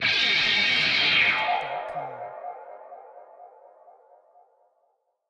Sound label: anime laser